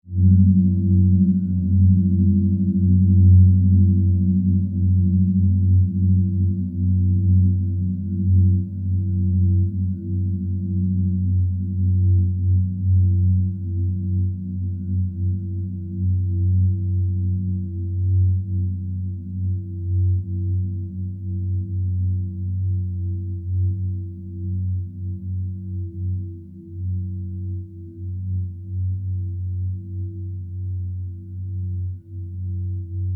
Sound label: surge fade slow, Various sweeps, pulses and drones; Rise And Dive; Rises and Dives